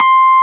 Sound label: SUIT AMP C5, Keyboards Old School; USB Soundscan vol.09; AKAI